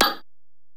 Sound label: EPerc, Alesis DM-PRO; 300 Drum Machines